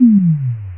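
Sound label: dead ghost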